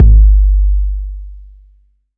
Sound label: 808's